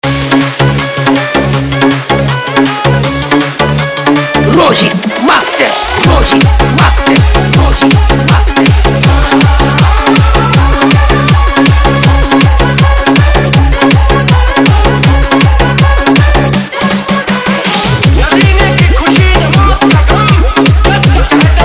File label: Bol Bam Bhakti New GMS Remix Song